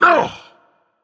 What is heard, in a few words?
grunt hit, dragon; fafnir